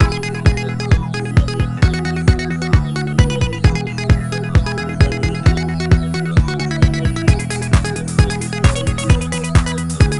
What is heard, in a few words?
Band No Vocals; Fictional Band 3; Clique The Diss and Make Up; NDS